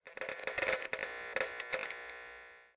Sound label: electricity failure